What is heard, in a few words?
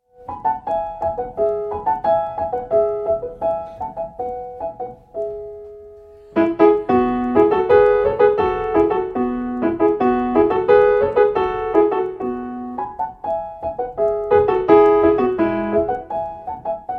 pieces for violin and piano; frank bridge; 4 short pieces